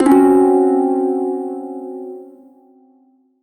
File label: Prime Shawzin Hirajoshi Chord, Music; Warframe